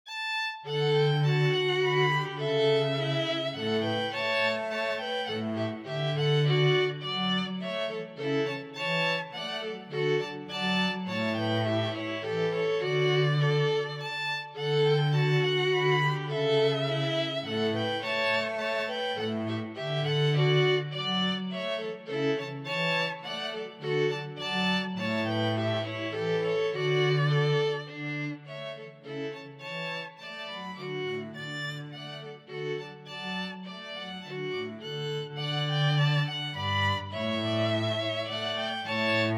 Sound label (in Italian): Danza in re per 2 Violini e Basso